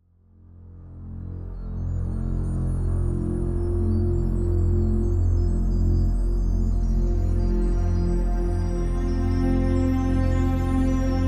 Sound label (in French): instrumental; Claire de Lune